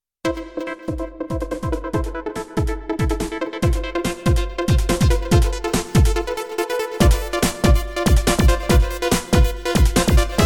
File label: rhythm